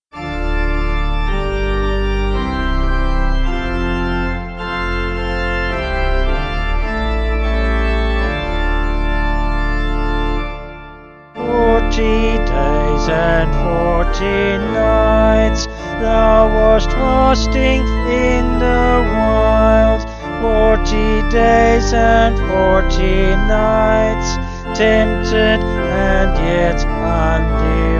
Forty Days And Vocals Organ; Aus Der Tiefe

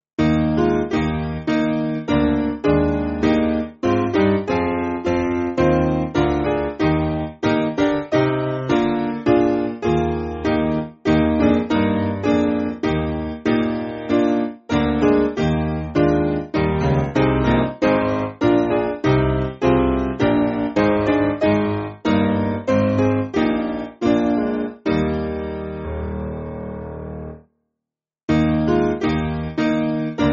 Piano, church music; Come Let Us Anew; Derbe

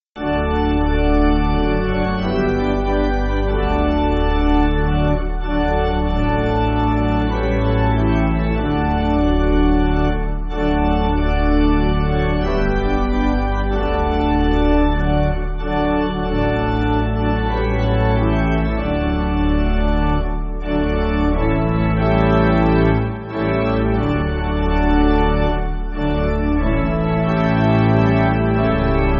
Pipe, church music

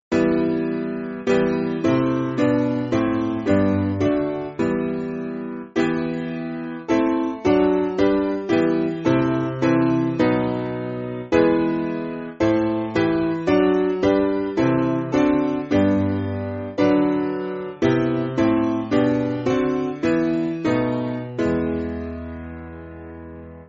Piano; Lord Keep Us; Erhalt Uns Herr

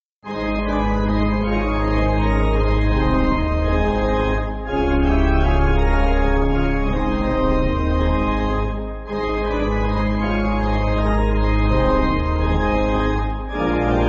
Pipe, church music

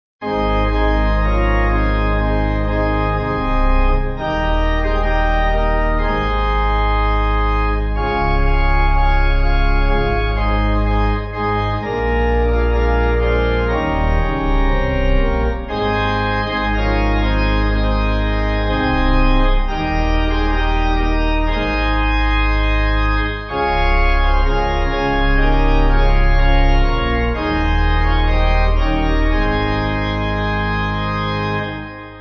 Standing By A Purpose, Pipe; Bliss

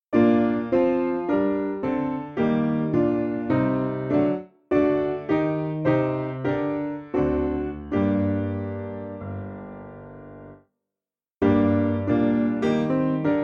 Piano

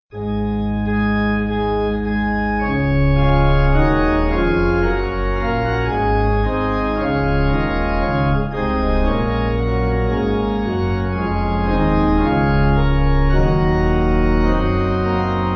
Pipe; Filled With The Spirit

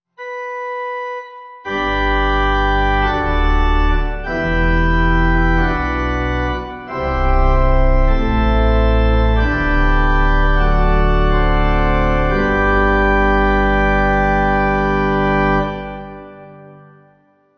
Threefold Amen Danish Pipe

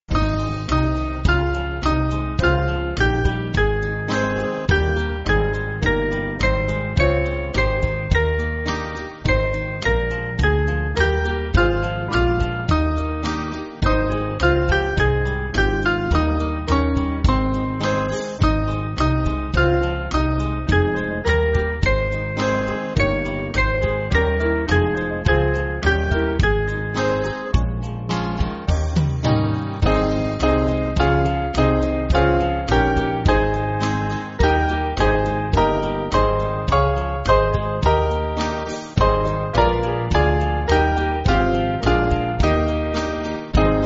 Band, church music

